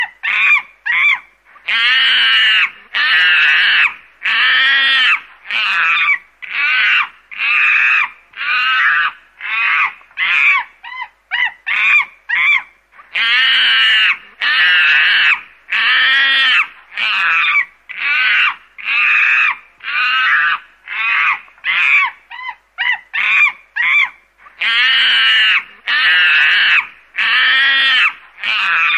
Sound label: angry monkey